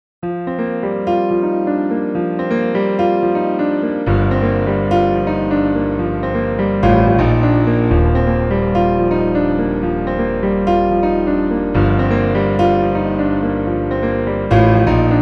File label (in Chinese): 钢琴; Komplete